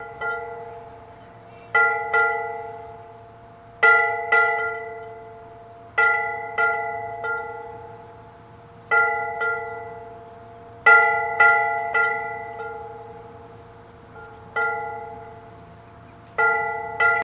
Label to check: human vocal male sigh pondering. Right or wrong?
Wrong